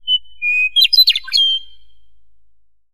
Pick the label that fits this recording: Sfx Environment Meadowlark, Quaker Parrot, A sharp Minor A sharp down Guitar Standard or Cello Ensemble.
Sfx Environment Meadowlark